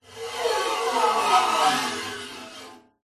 musical guitar steel string knife scrape strings weird abstract scraping tone